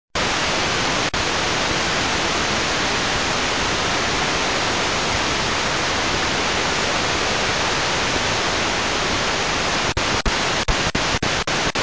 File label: 85 110 MHz